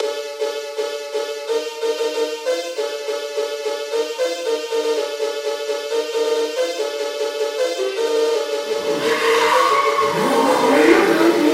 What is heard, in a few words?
evil phonk nightcore